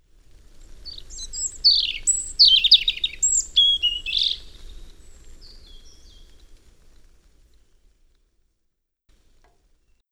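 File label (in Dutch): Roodborst Erithacus rubecula, fluiten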